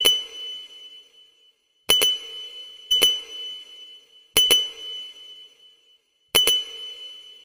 Nokia chat alert SMS ringtone

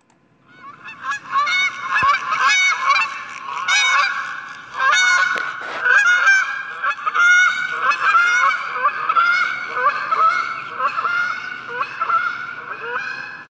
canadian geese ringtone

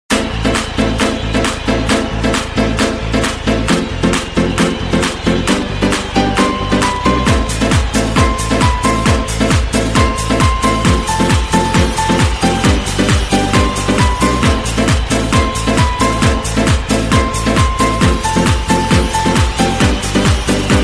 wave ringtone

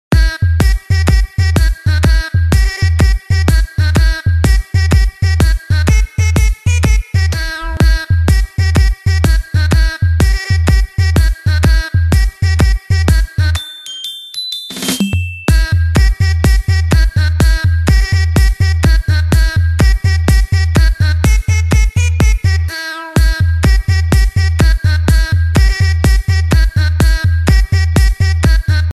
beats ringtone

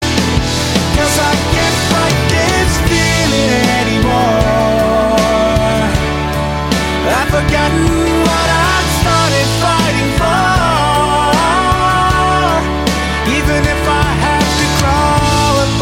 romantic english song tone ringtone

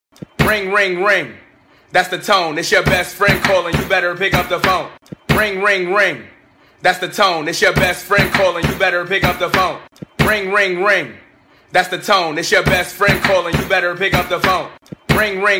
ring ring ring ringtone

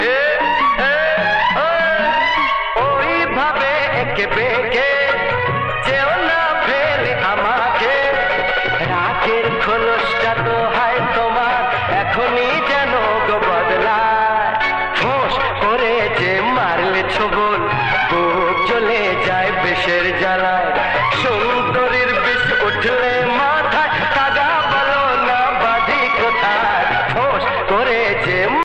bangali ringtone

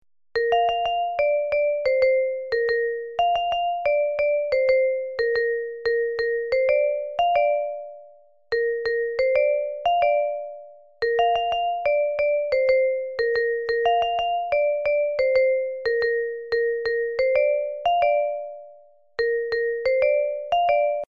apple message notification ringtone

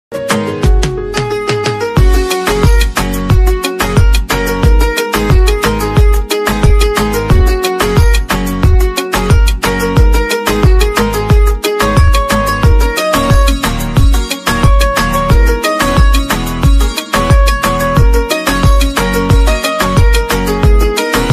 bollywood loudly music ringtone

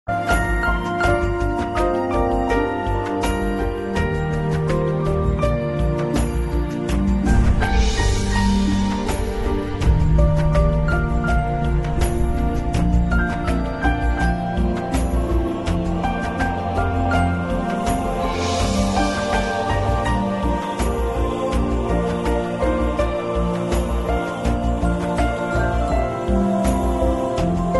love tone ringtone

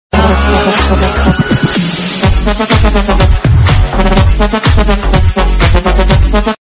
background theme music ringtone

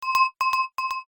bell ringtone, sms

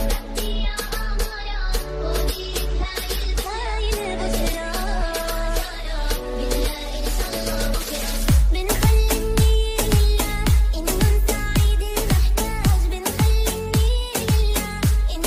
arabic ringtone

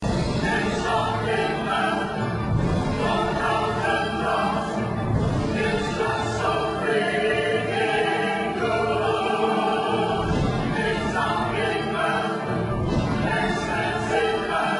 iphone spirit ring ringtone

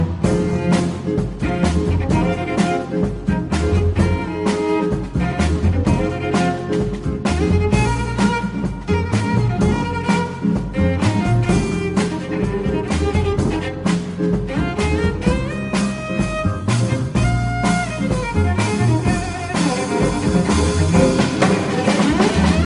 cello concerto ringtone

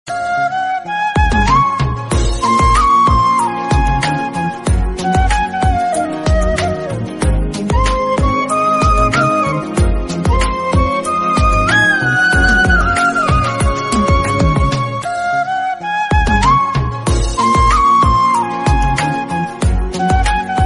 cool classical music tone ringtone; nokia ringtones

